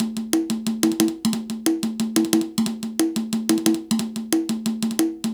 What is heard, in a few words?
Conga Loop; Sonic Foundry (Sony Creative Software) - Fluid Dynamics Drum & Bass; Construction Kit; Percussion